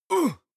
male combat hit soft; schreie; menschen; soundpool

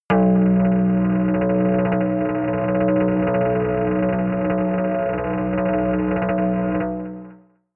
synth bass rattle tremble, drones

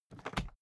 briefcase plastic slide hard; industrie wirtschaft; bueroarbeit

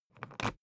briefcase plastic latch lock key pull out ringing

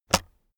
keyboard external space release heavy

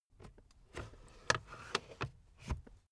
car backseat table fold in slow

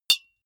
deodorant hit, metal; soundpool

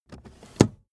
car accessories cup holder cover close fast; autos; verkehr transport

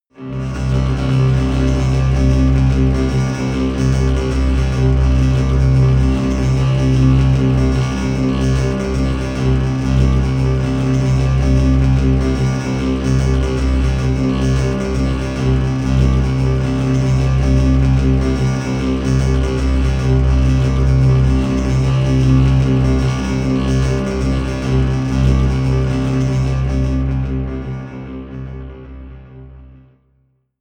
drone erratic pulse

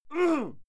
male fight yell muted; menschen; schreie

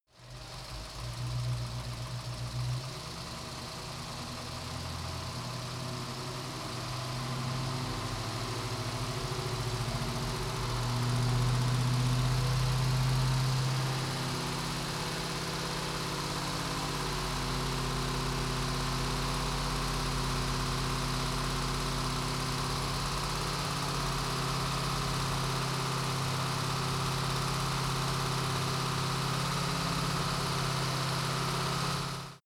car camaro accelerate heavy engine

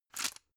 drug blister pull out hard; berufe; arzt

